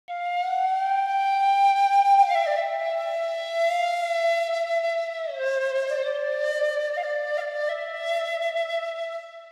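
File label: flute E minor 100 bpm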